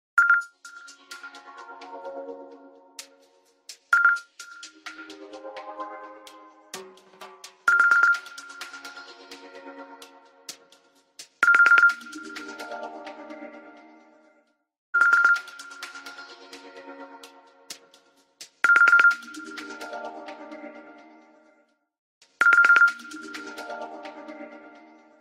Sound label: Nokia Clock Alert Ringtone; ringtones